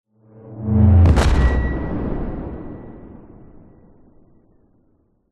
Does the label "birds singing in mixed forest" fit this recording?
No